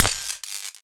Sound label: atk elec wand funnel